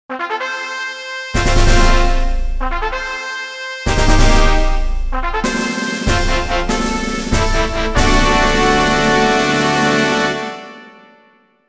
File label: General Race Fanfare; Tokyo - Nakayama